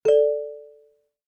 appointed, message tones

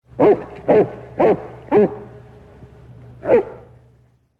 dog barking, dog barking at the moon; animals

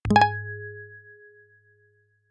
undeniable; notification sounds

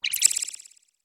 synthetic; notification sounds

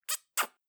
asmr girl kiss; ringtone; asmr ringtones

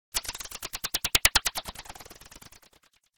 flying by, message tones

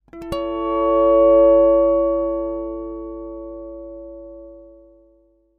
attention seeker, message tones

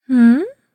hmm; voice-ringtones

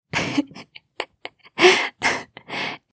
asmr girl giggle; asmr ringtones; cute girl

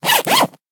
zipper back and forth; everyday objects; opening closing

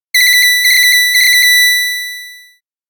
assiduous, ringtones; standard ringtones

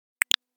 happy to help, notification sound; application user interface ui sounds

